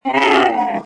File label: goat attack